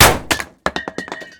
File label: canister; Original TK Sounds